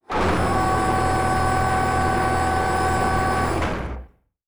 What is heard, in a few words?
servo big, sci-fi sounds, mechanical